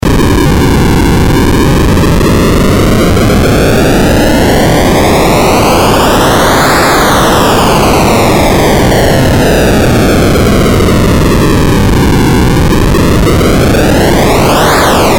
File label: noise, modular